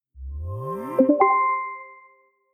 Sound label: Startup; Whisper